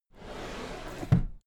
drawer cabinet equipment close regular

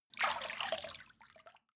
step water walk out

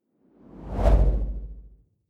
whoosh impact soft wobble bass

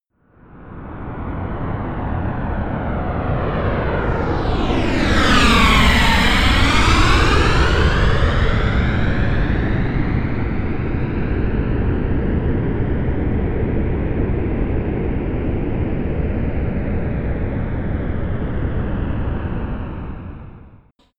plane airbus a320 flyby close

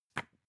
magazine page handle

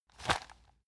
plastic bag hit hard

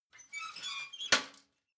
ladder metal handling fold

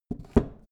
wood handle

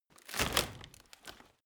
rotten wood break

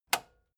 metal button spring push

